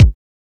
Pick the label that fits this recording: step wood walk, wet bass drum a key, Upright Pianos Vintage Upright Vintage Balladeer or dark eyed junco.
wet bass drum a key